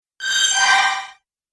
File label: Retro Random Bleep Accent; Video Game Sound Effects